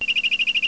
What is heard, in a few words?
bird